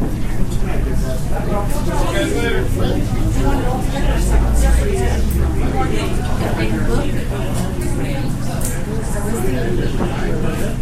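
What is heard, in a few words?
ambience Cafe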